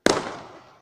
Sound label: shoot Snap; Mindustry